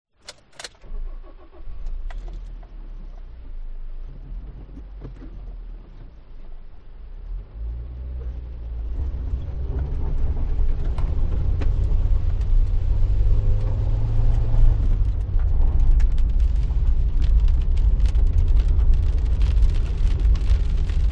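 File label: vehicles car start drive then stop engine off stoney track light rain hit windshield; int persp; zapsplat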